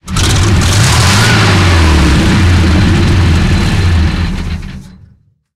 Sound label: panzer6 engine load, tanks; War Thunder